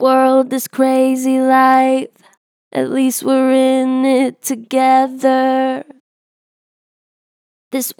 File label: Str Vib World this crazy life, Vocals, Natural Vibrato Strong; Harmor Vocals